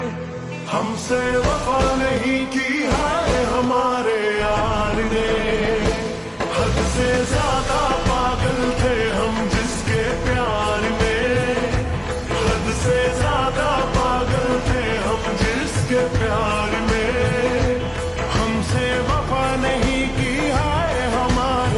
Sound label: Slowed Reverb Ringtone